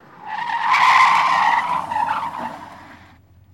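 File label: Cadillac 72 Corner Skid; Cadillac 1972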